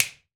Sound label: finger pops